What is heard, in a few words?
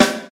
boomy acoustic snare sample a key, Royality free steel snare drum tuned to the A note; snare-drum-samples